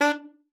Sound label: D4, ALTOSAX; Xsample VOL-2